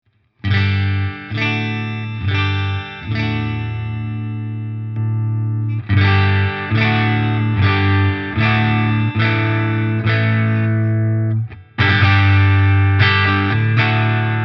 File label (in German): NUX Diamond 63 OD Top Boost aus Cut fully CCwise Mid PU